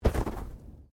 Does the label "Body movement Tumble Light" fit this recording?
Yes